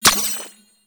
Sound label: Puzzle Symbol Switch; Venus Kahl; Warframe; Quests; Veilbreaker